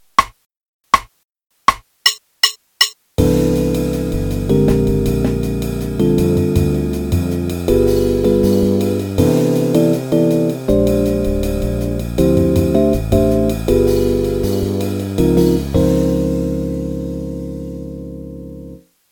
Play-a-long C Major Scale, to the 9th and down qn=160, Jazz Piano